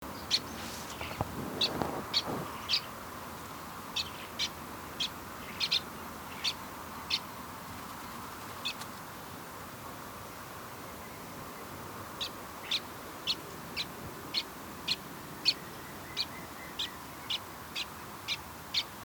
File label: Rock Pipit, St Mary's Island; passerines